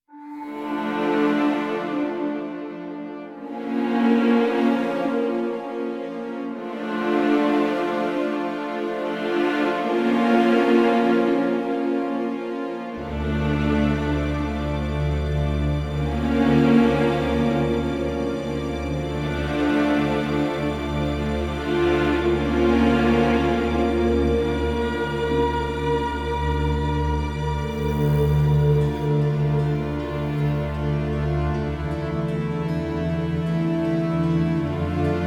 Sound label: Bad News Ahead Full With Brass